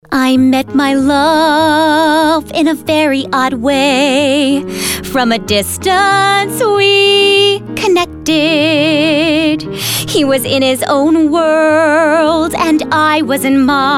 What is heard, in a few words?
Singing